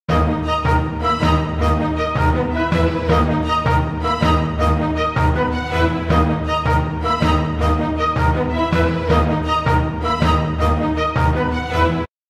Dramatic Music

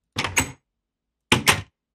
Door (Open and Close); Minecraft

